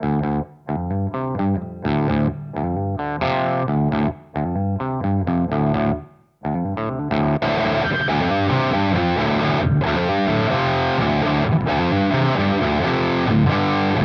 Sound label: Channel A Fuzz